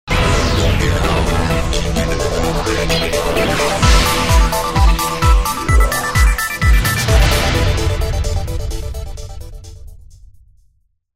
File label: NEWS GENERIC